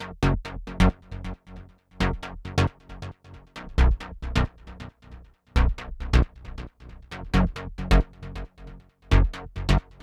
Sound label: 135BPM Bassline WET, F# minor